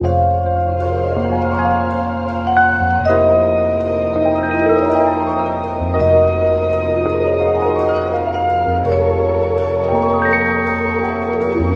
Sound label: vibes, Sad